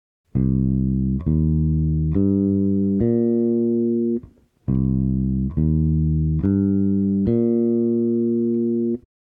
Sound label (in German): moll septakkord